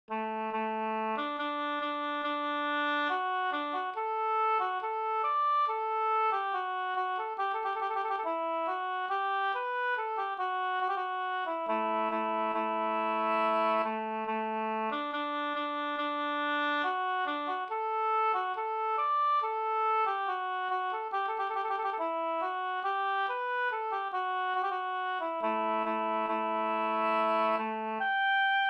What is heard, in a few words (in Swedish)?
brudmarsch; Lejsme Pers brudmarsch; Malung